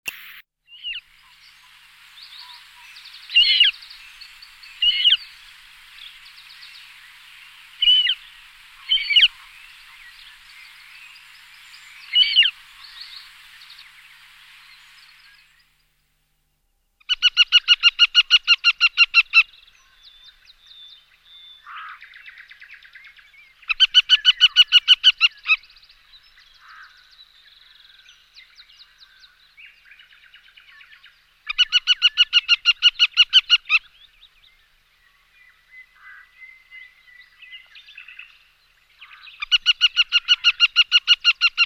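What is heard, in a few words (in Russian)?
bolotnyy lun golos, marsh harrier voice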